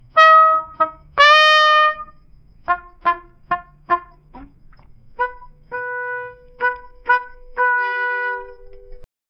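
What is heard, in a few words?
cartoon boing boing trumpet, wah-wah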